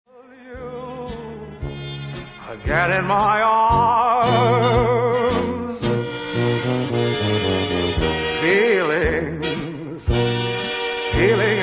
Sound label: feelings vocal